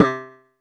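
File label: RX5 electric bass H; Crypton - DrumBase5000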